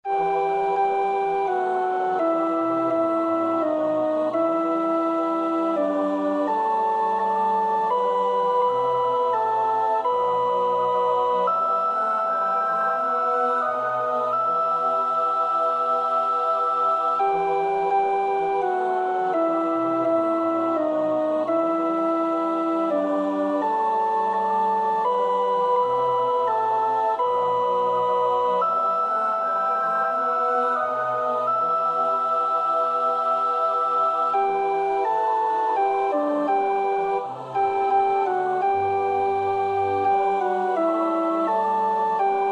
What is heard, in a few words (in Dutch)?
sopraan, koorpartij; Bach (Johann Sebastiaan)